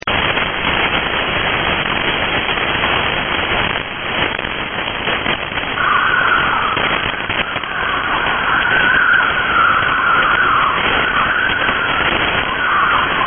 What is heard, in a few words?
Spooky Noise